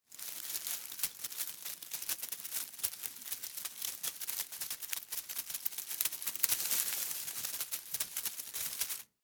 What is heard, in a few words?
Insect Walk Leaves, Foliage, Movement, Bug; Animal; Insect Movement Sound Effects; BlastWave